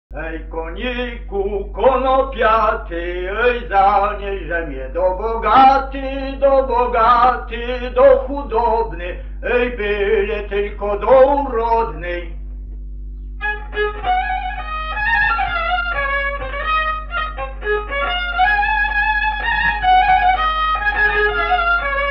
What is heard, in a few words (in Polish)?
przyśpiewka; Hej koniku konopiaty; Dobrzechów; nagr. F. Kotula, 1963